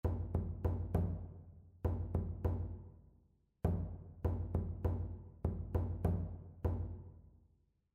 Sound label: rhythmic error detection; ear training